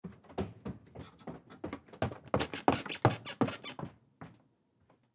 Footsteps Walk Down Stairs Underneath Staircase Perspective; Foley; Blastwave